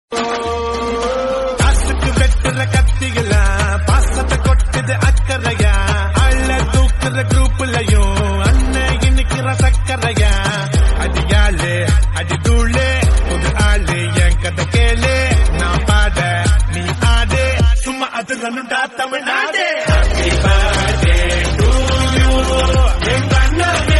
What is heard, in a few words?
Happy Birthday Song For Kids In Tamil song